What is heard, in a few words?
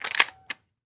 Weapon Gun Shotgun 12 Gauge Benelli Automatic Ammo Eject; AdobeCC